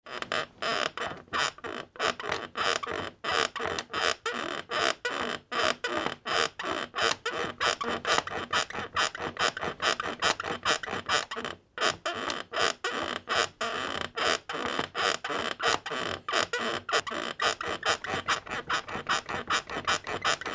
Springs Squeaking Bouncing on Bed, Various rhythmic springs; Squeak Sound Effects